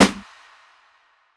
Snare A Hit, Bmin; Hip Hop; Hip Hop Exotica; Apple Loops; Big Fish Audio

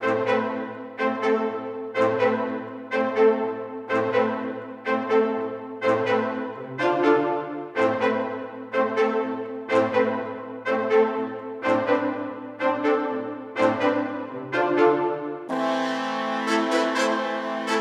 124 BPM A Min Brass; Cymatics; Destiny Gold Expansion; Dior

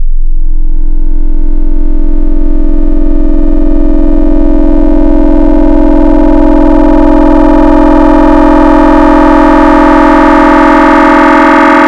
Rip The Sine, FM Wavetables; Cymatics; FM Wavetables Vol 3; Serum

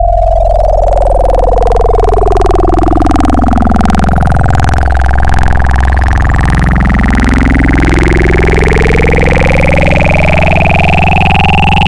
Sine AM, wavetables; Cymatics; Wavetables Collection Vol 3; Serum

